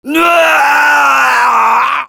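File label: pain critical death, fr; Heavy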